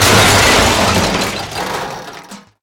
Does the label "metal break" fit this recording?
Yes